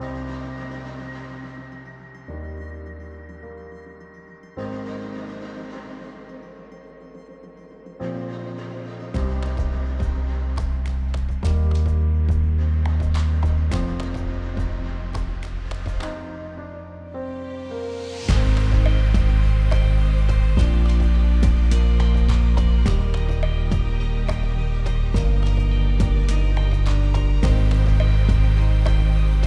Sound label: Soundbed Call waiting; Aramco; sonic